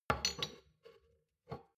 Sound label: shot glasses stack slide soft; soundpool